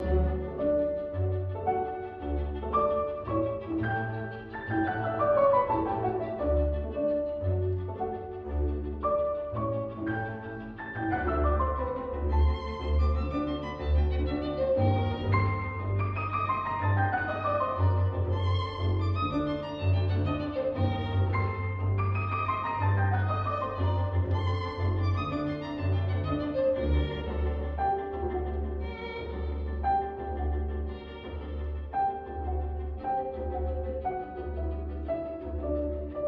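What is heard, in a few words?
movie classical piano quintet; The Sims 3; windows gamerip 2009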